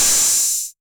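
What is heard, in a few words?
808 open hi hat Tape Sat; Goldbaby Tape808 & Tape909; The Tape 808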